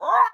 parrot idle; minecraft; mob

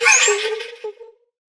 allay item given; minecraft; mob

